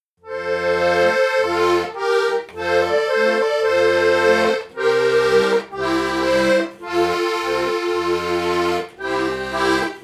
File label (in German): Kling Glocken kling; GB Corso